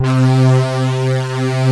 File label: OB STRINGS; Pro-Rec - Synthsonic; JV ANALOG2